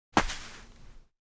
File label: Footsteps single step on sand